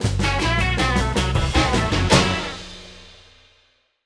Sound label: large Win